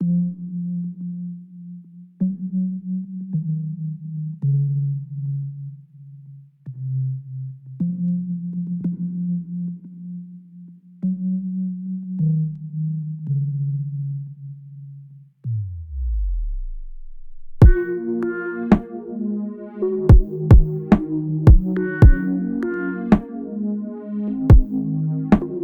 Outro Instrumental

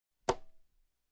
Drums Percussion Conga Slap

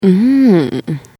mmm

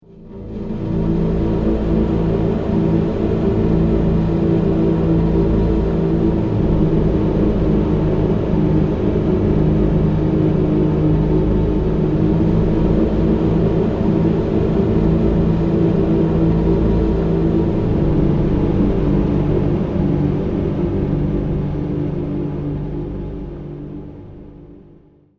space

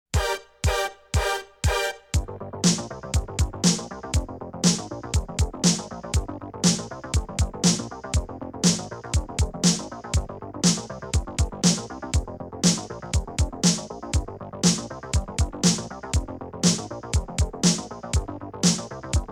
Drums Percussion Cool Gove

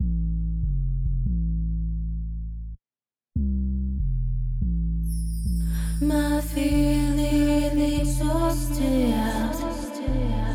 Exhausted Female Hip Hop Mix; HasenChat Music; Rap and Hip Hop

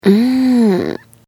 "mmmm"

